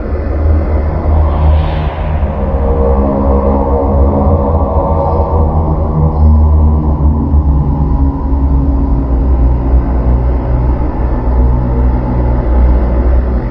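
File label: ambient death chant; hellhole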